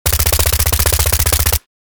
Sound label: Sterling submachine gun short burst; war